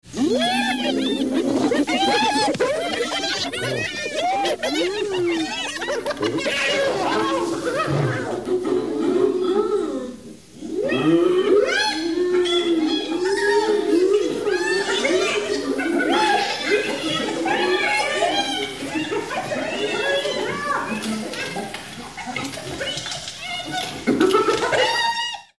Laughing Hyenas; Halloween; Hallmark Halloween 1989

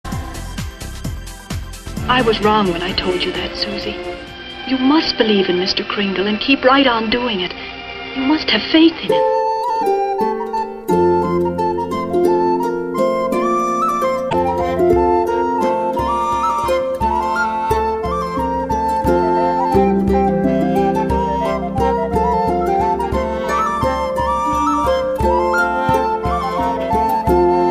Waltz; Christmas

